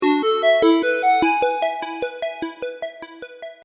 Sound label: jingle; ユウラボ8bitサウンド工房; ほのぼのした感じ